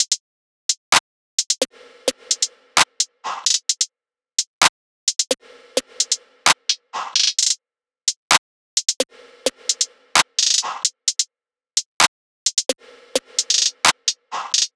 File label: beat loop lime top 130